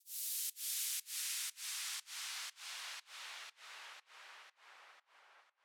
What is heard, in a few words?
Big EDM Drum Loop Fx noise, 120 BPM; Future House; Future House Tools; Big EDM Drum Loops